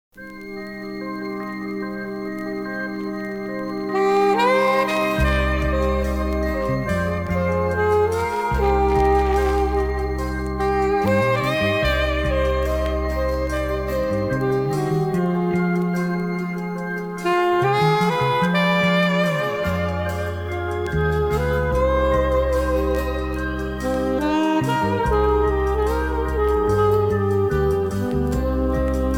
saxophone

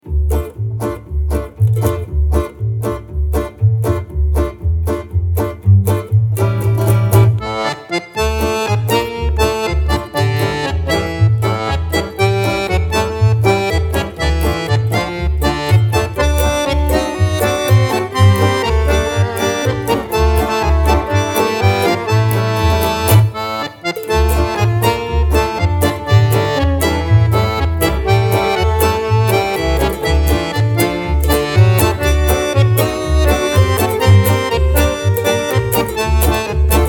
polka

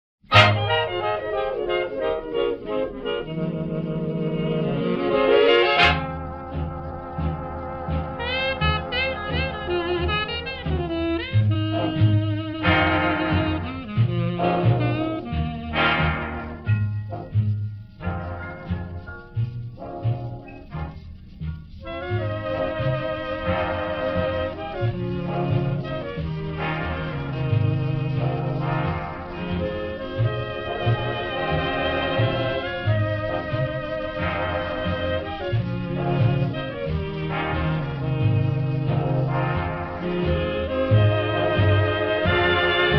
instrumental

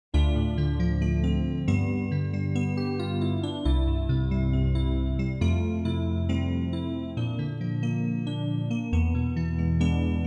sax; Soundscape UK

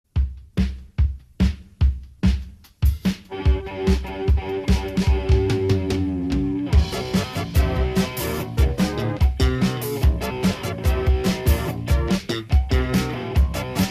instrumental; Мелодии и Ритмы

